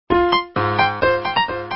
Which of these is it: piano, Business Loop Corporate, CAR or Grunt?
piano